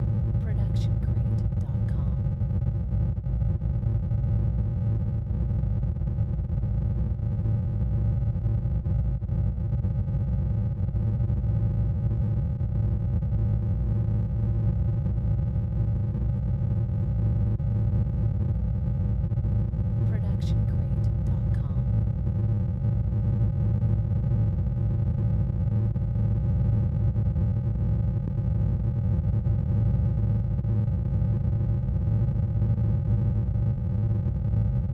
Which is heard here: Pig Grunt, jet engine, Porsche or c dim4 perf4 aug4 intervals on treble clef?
jet engine